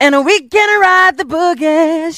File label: spy positive vocalization, voice packs; spy jackson